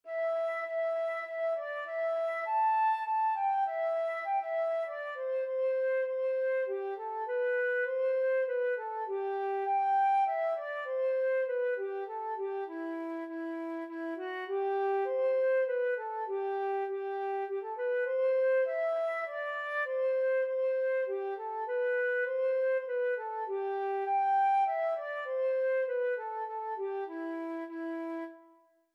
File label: Isabella Burke flute